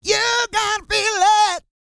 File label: SING, GOSPEL; Vocal Planet Vol1 Gospel